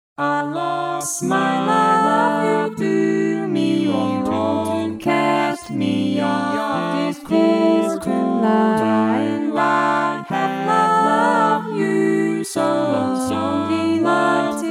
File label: Greensleeves SATB